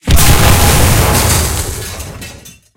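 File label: tank hit high-explosive anti-tank big; heat; sfx tanks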